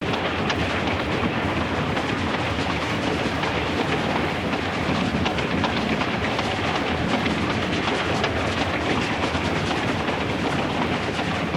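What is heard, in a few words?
Crane Train ambience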